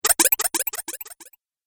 Laser Echoes; Sci-Fi Laser Sound Effect

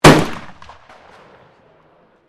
RIFLE MOSIN NAGANT 9130 FIRING; Rifles, military; Rifle Sound Effects; Guns

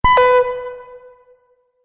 Multimedia Event Notification, beep sound effect

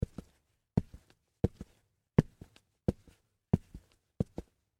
Footsteps Boots Walk Slow; Human

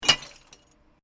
Bottle Plink, Glass; Glass Sound Effects; Glass (Sound Effects:Materials)

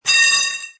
Metal Pipe roll; Metallic Sound Sound Effects

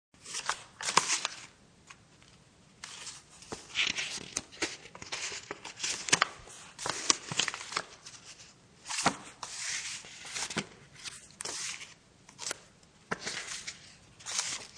Shuffling a pile of index cards.; Objects

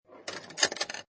Pinball Sound Roll On Rail And Hit Metal Parts; Video Games

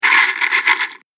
Shaking A Box Of Matches

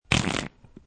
Fart; Farting sound; Human

